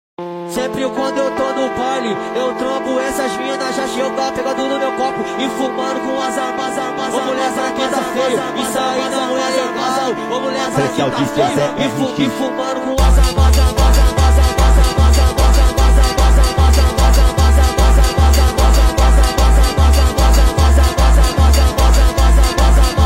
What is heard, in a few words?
Funk